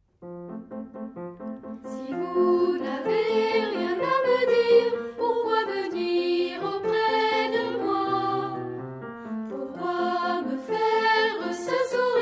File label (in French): piano chant; mozart symph concertante 1e mvt